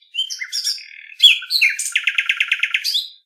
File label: nightingale